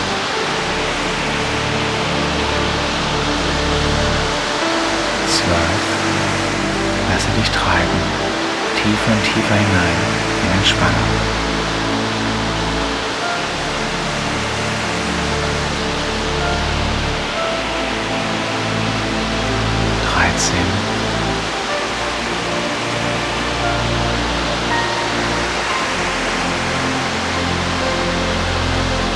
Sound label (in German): Meditation